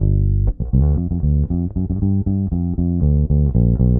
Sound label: Bass, 70s Analog Funk